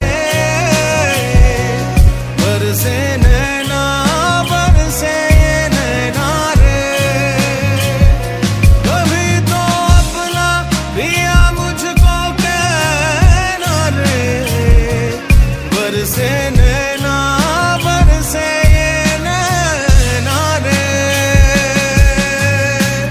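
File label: original soundtrack pakistani ringtone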